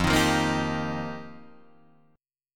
F 7th Suspended 2nd Suspended 4th Guitar DADGAD, chord; F7sus2sus4; 3,6,3,3,6,5; strummed